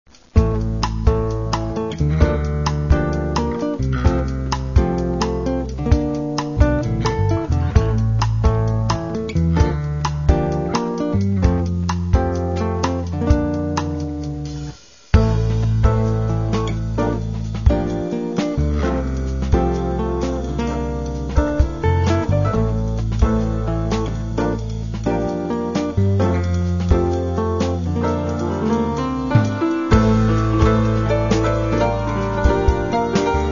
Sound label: fusion orchestra